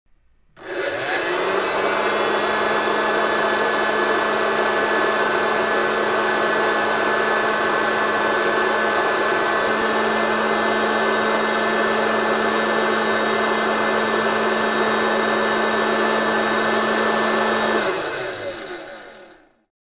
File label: Turn On Popcorn Maker, Motor Run Steady; air popper